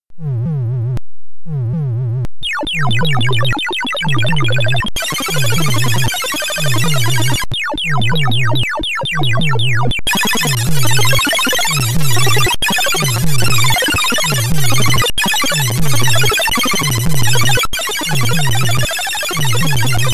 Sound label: Ringsignal